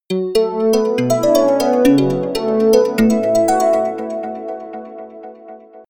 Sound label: ringtone melody